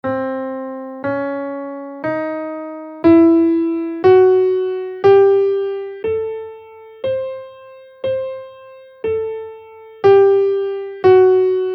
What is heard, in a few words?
ultralocrian bb6 scale in C